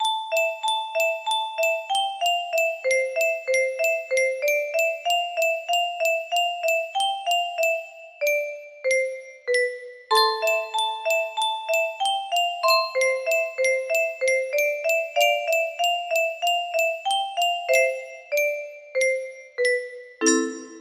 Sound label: carillon